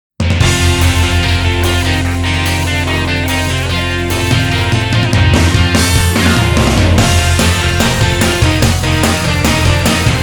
Off Vocal; Da Capo

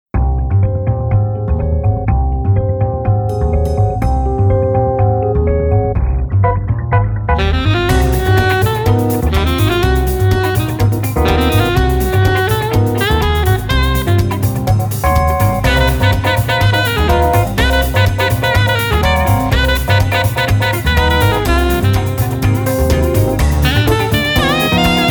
sax; Shinkyoku Soukai Polyphonica

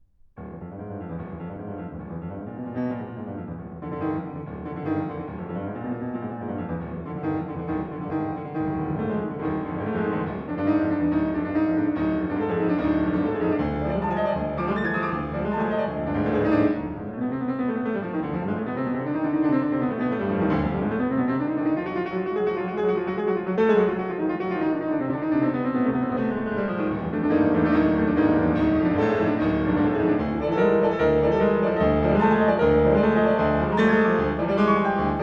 Piano Allegro Molto; Nodame Cantabile; La Folle Journee Selection; Sz62

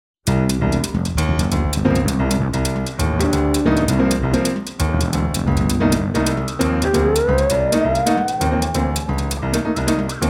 Piano; Tiny Snow Fairy Sugar

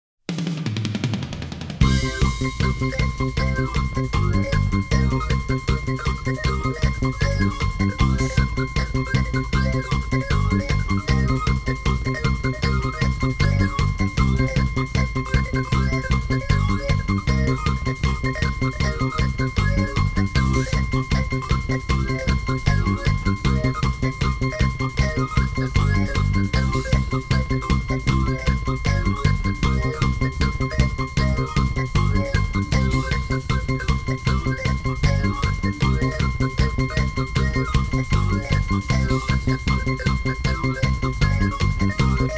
fast tempo, rhythm only; Neon Genesis Evangelion; Evangelion S2 Works

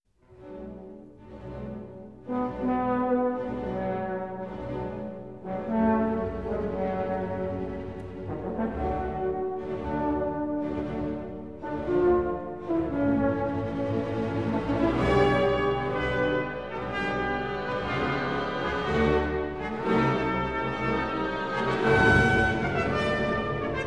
Symphony No. 7; Symphony No. 7 in A minor (Song of Night) I. Langsam ? Allegro risoluto, ma non troppo (Gustav; Legend of Galactic Heroes; Galactic Empire SIDE

